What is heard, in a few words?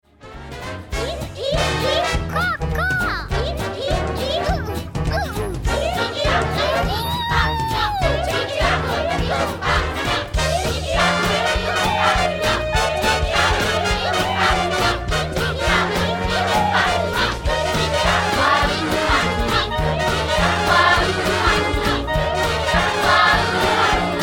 Jungle Rhythm; Jungle Book 2